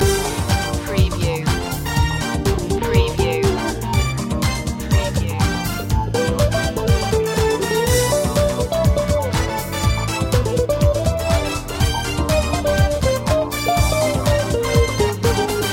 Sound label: snapshot style loop